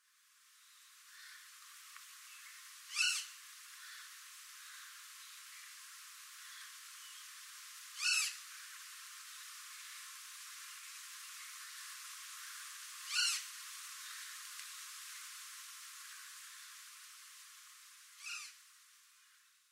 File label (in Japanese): rurikakesu; amami